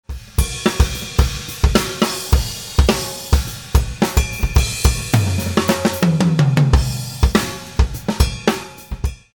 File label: Paiste Crash Set